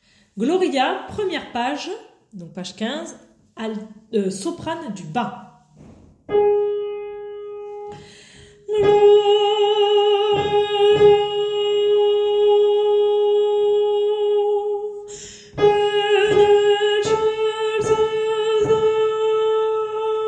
Gloria soprano bas

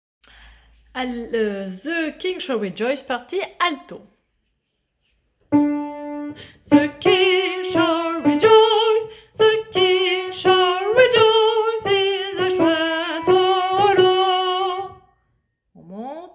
partie alto

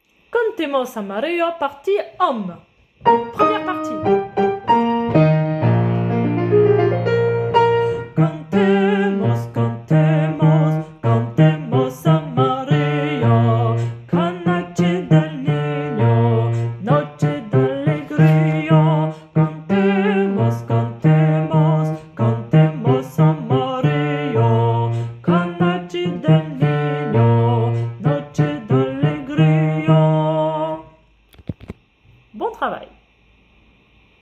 partie hommes; Cantemos 1ere partie

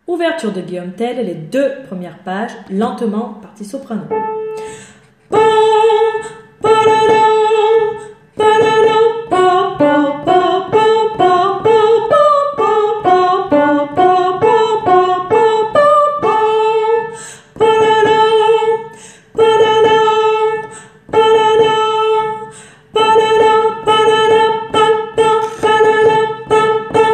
Soprano; G.Tell

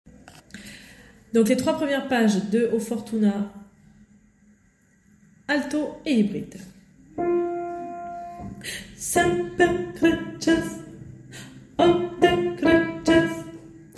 hybrides alto; Oh fortuna 3 premieres pages

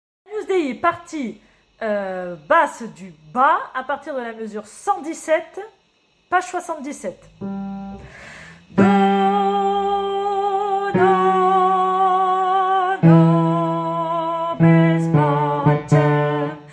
basse bas; Agnus dei m117 jusqu a fin

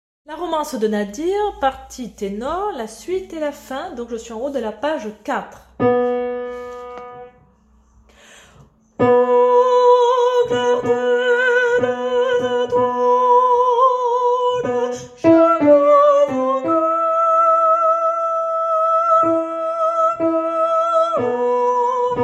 partie tenor

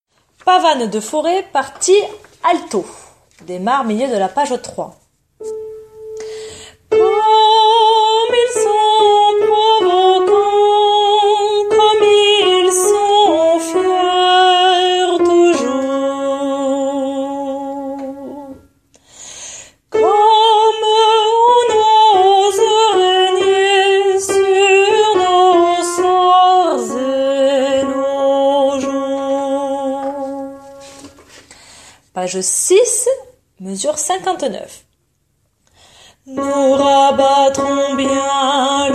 Alto; Pavane; Faure

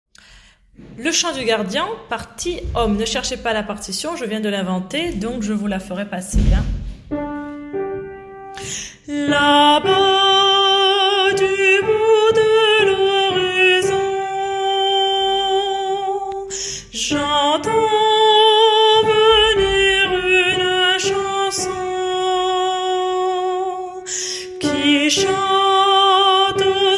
partie homme

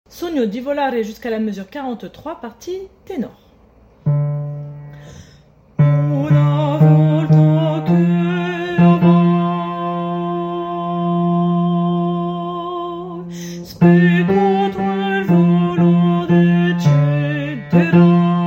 Tenor

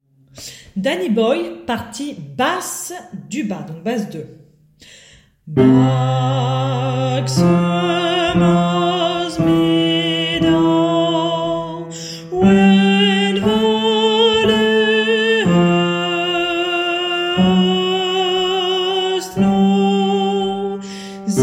basse

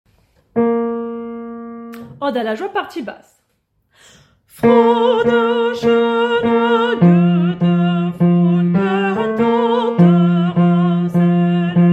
partie basse

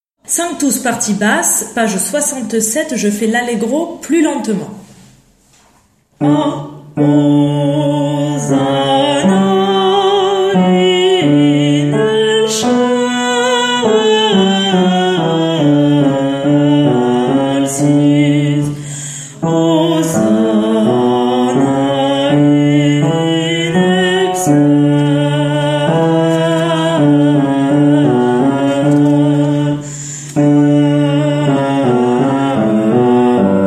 Basse lent; Requiem; Sanctus; Mozart

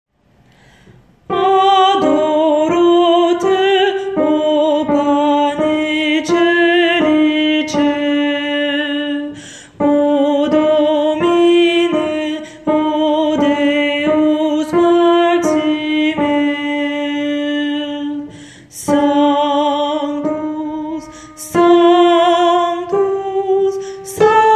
basse; Adoro te

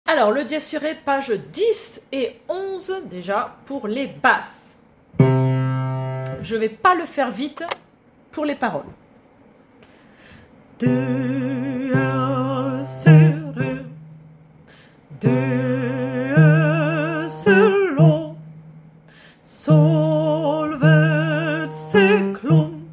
Basse

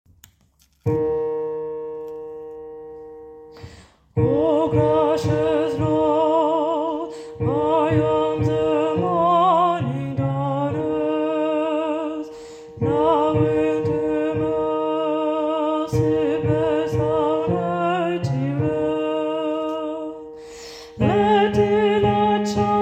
basse haut; Finlandia

